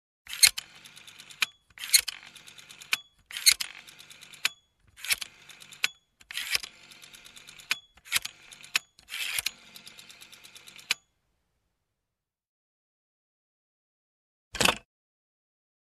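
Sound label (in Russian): звук набора номера телефона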